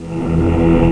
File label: heal; Odyssey Demo